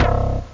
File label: mirage bass; OctaMED SoundStudio V1